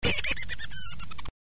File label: Sound effect mouse giggle